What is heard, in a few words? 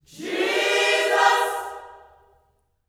JESUS CHD, Vocal Gospel